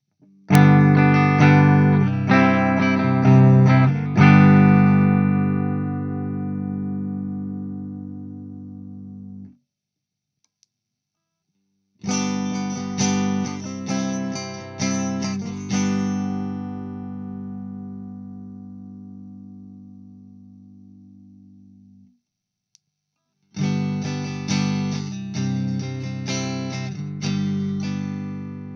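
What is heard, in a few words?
clean sm57, guitare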